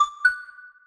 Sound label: email notify